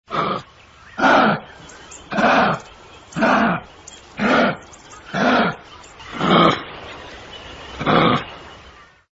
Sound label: kitten; animals